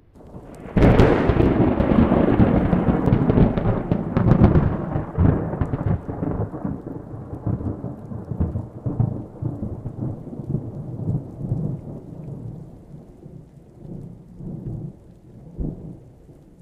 Piezo crystal far; Materials; Barotraumatic

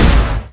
small fire; Weapons; EK modern; Barotrauma

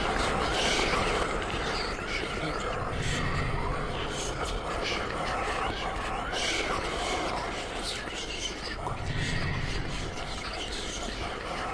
whispers; Barotraumatic

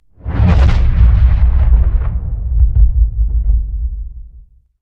Pylon far; Barotraumatic

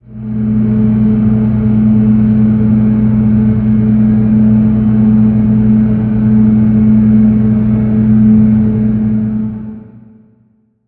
Pylon idle; Barotraumatic

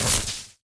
shield hit; Barotraumatic

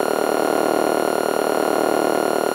scan active; Thalassophobia Restored; Jobgear; Scientist

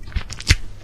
squelch; Barotrauma; Neurotrauma

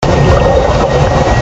Medium Projectile Cavitation; Soundproof Walls 2.0

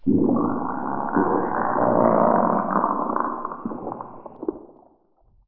death; Barotrauma; Barotraumatic; Coelanth

